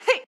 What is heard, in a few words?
Swipe; Jackie